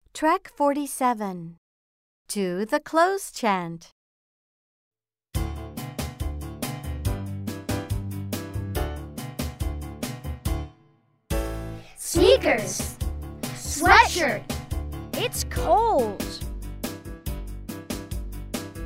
the clothes chant

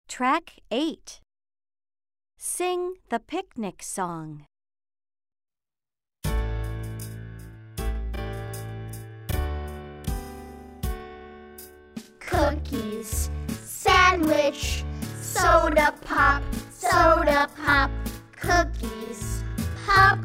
Sing the picnic song slow

